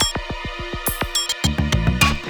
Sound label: UGH